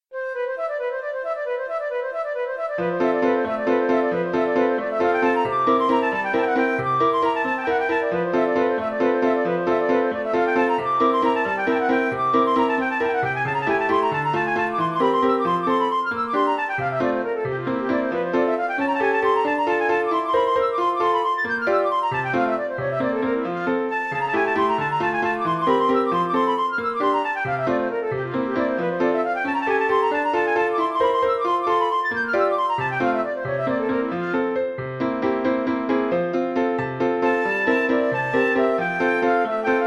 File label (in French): valse